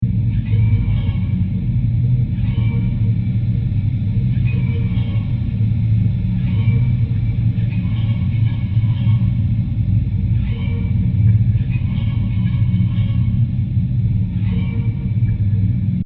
Deep Noisy Ambience With Diffuse Alarm Sounds 120bpm; Scary Soundscape; Crafted Signals